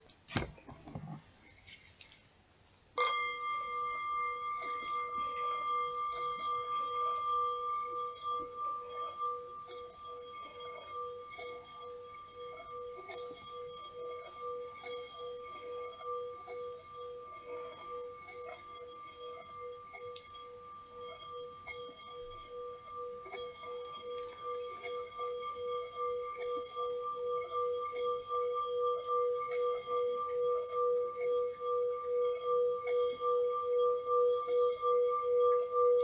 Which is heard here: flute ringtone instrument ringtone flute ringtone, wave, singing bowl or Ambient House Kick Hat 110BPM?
singing bowl